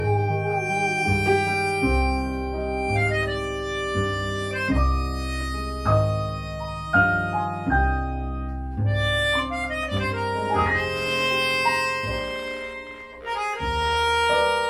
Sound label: Pigini Free Bass